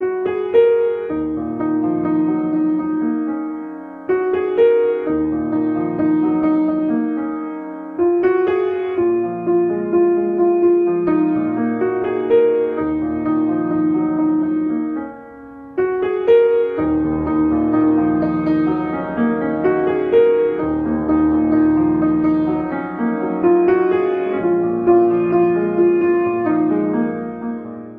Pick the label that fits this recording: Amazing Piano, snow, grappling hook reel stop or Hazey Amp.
Amazing Piano